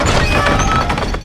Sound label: door; Shin Megami Tensei IV Apocalypse; 3DS